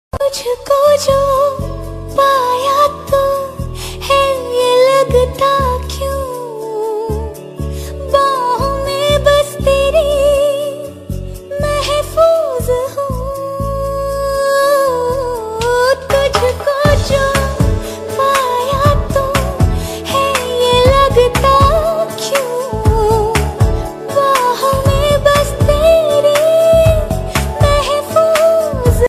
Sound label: female hindi ringtone